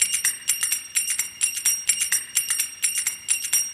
Latin House Loop 128 BPM; Vengeance Samples

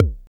Percussion

